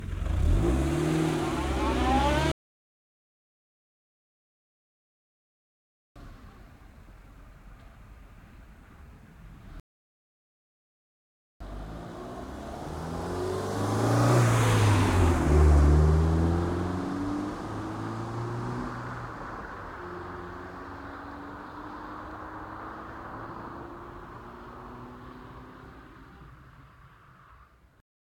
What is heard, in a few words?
renault kangoo 1.6 16v exterior short reverse and slow drive away stereo; SoundHolder